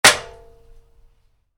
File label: Wood hit aluminium, Wood, Light Impact: Aluminum; Wood Sound Effects; Wood (Sound Effects:Materials); Stuart Duffield